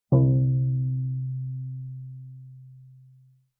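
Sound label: Cello pizz fortissimo sul C C2; MIS Pitches - 2014; Strings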